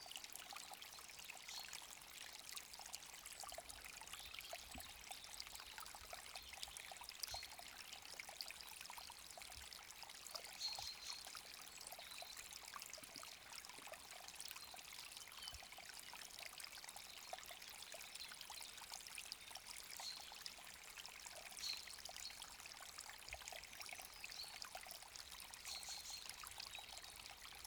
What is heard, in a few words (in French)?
rio petit filet oiseaux; arbucies